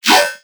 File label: synth hit yoink metallic E; Virtual Riot